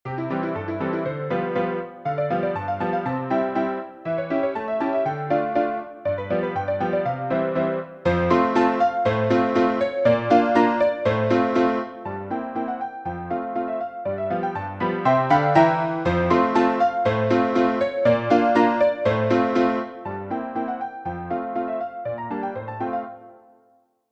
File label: PIANO